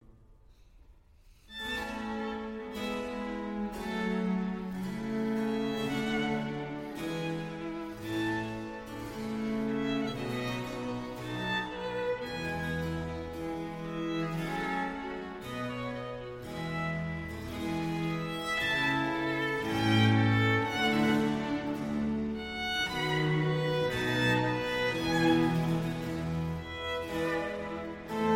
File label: Concerto for Strings in B-Flat Major, Andante; Vivaldi